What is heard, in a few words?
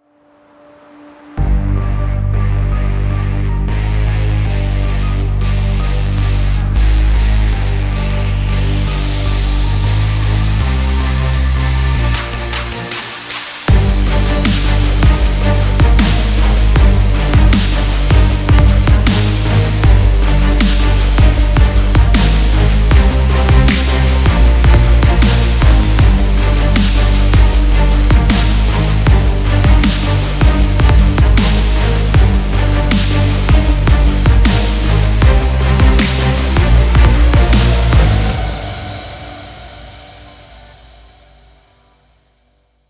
Aggressive